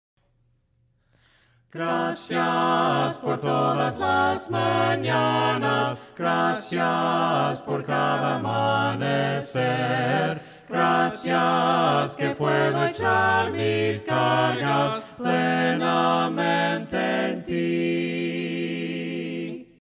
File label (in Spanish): VOCES, himnos a capella; cantos del camino; GRACIAS POR TODAS LAS MANANAS